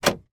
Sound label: van central locking lock internal iveco; transportation; sound effects; sound effects one